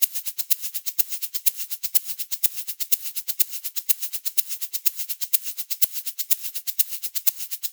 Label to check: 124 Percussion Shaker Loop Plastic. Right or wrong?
Right